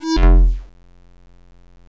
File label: player death; Station-0